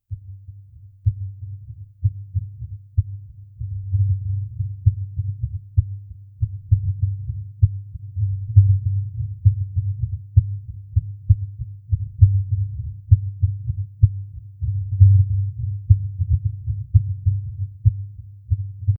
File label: a dramatic crescendo